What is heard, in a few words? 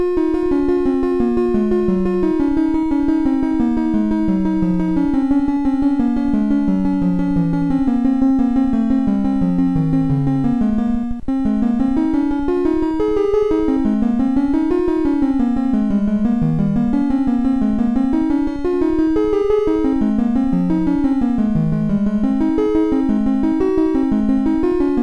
BASIC sid, MOS6581R3; Color-Code